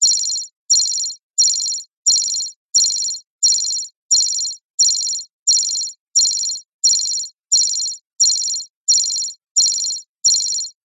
science fiction small digital computer alarm warning beeps non urgent